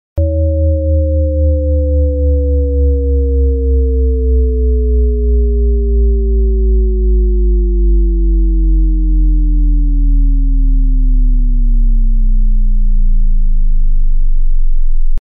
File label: Bass Sweep